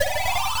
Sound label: powerup; mazeofgalious